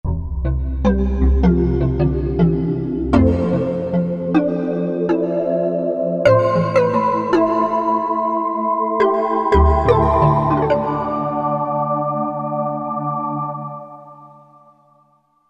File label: Drum Voices